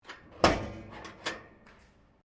CAR HOLDEN HD PREMIER OPEN BONNET, Classic Australian cars; Car Holden Hd Premier 1965 Open Bonnet; Cars (Sound Effects:Vehicles)